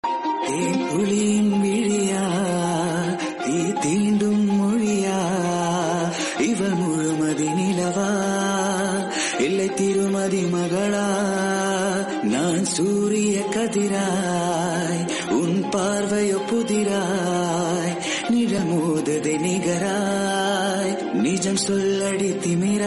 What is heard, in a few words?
Tamil South Indian